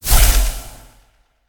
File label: swing; smite; god serqet